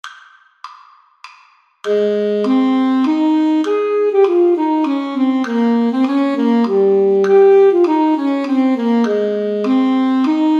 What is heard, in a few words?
alto sax